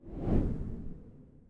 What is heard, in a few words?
quick wind whoosh